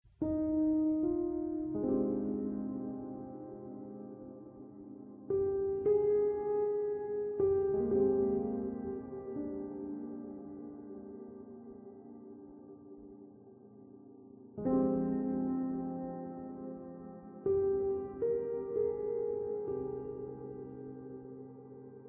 Music Intro Piano Theme

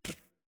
Walk; Antihero

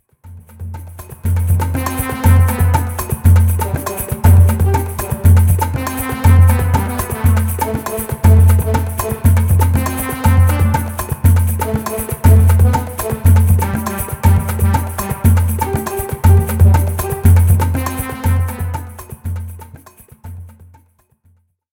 Samba; Lego Bricktales

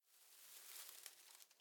Hay Movement; The Town

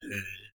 Monster Strain; A Robot Named Fight

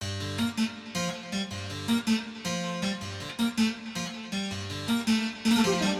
forest bgm spooky; chicory a colorful tale; ps4

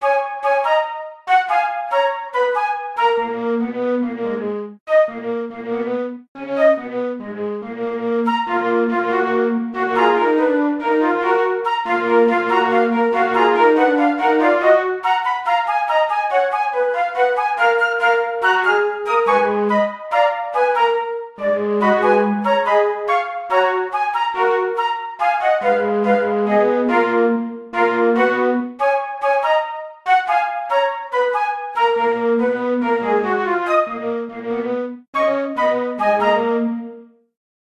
Flute; Badh'r Kilnfest; The Bard's Tale Remastered Trilogy

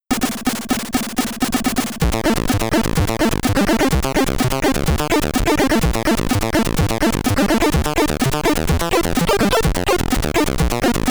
Boss Theme (SID Stereo)

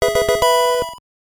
Level Up Fanfare; Pocket Monsters Midori; 1996 sgb

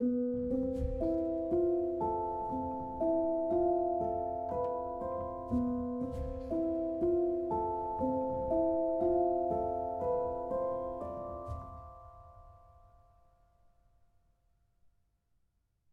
mountainvillage bgm 60bpm; chicory a colorful tale; ps4

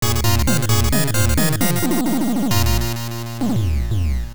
Game Over; Image Fight; TurboGrafx-16

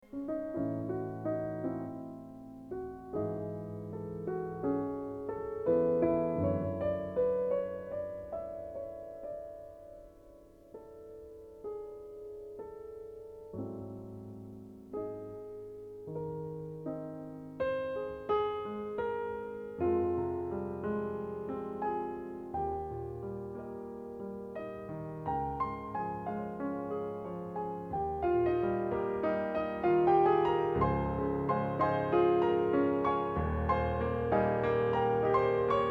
[Piano Ver. #2]; Metal Gear Solid Portable Ops Plus

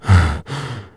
Fatigue Light; 1916 der unbekannte krieg gamerip

